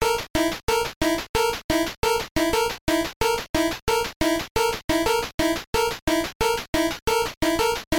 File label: boss theme; simpsons